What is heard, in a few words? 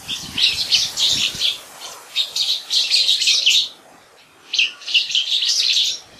Black Bulbul calls, Taiwan; Hengchun Peninsula Pingtung County